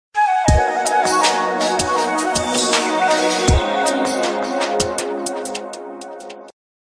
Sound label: Romantic Sms Ringtone